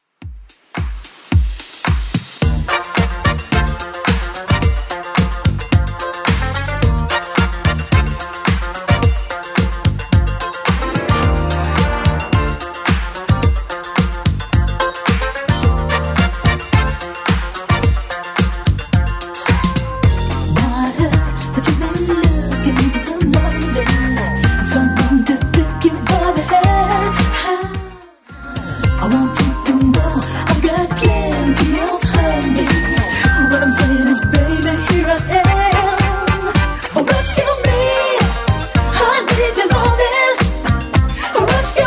80s Club Edit 109bpm

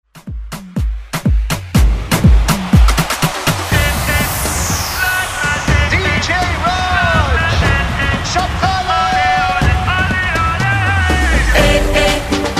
Afro Redrum

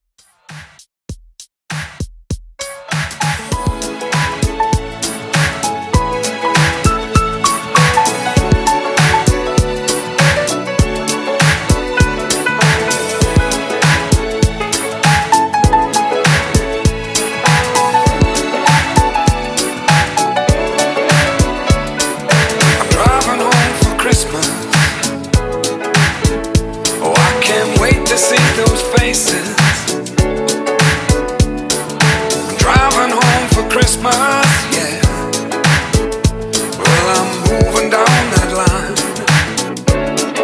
Classic Re-Drum 99 BPM

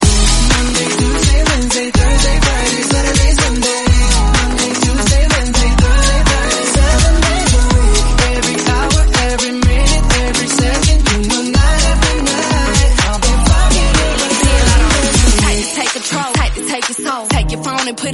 125bpm B

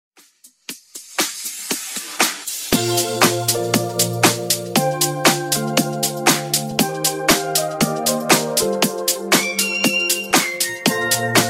Funk Nu Disko 118 Bpm

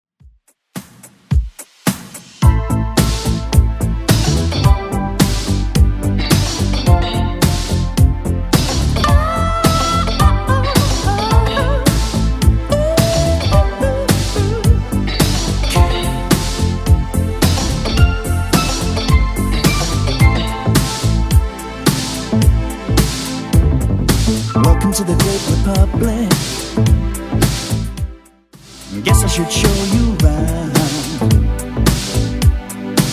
80s Redrum 108bpm

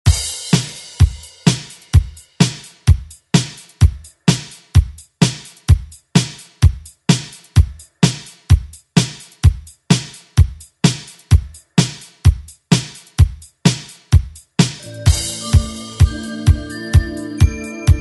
70s redrum; DJ Mhark

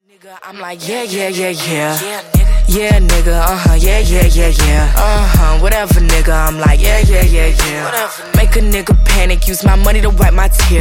80Bpm

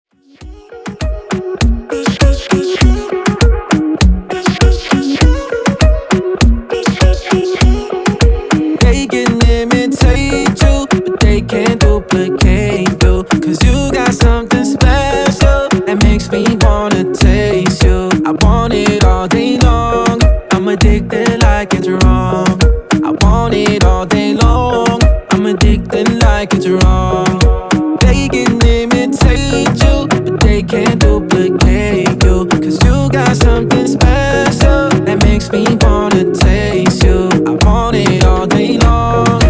Reggae Redrum

